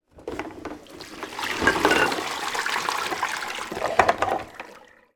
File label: household kitchen sink bowl tip water cups dishes; zapsplat